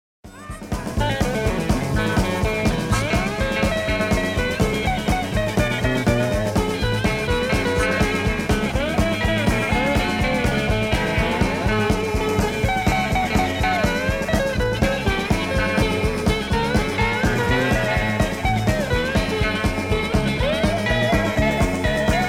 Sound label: solo